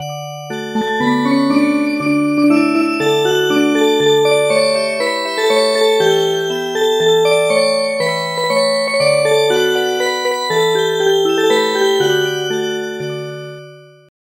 Music Box

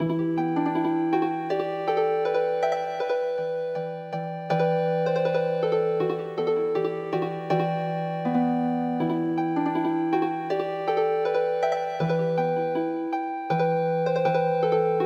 Swedish Healing Harp; midi traditional

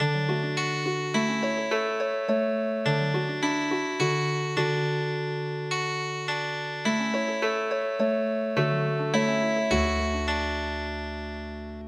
WESTMINSTER CHIMES HARP

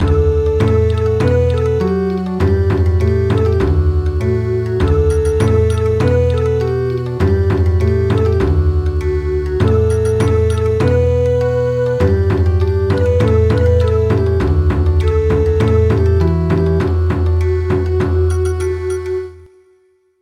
Bon Odori; Many Years

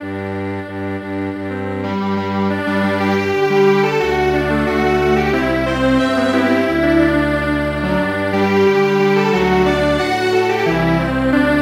Strings Quartet

